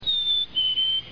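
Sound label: black-capped chickadee, birds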